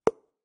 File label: pop; CrossyRoad